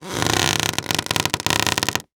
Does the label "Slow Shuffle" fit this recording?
No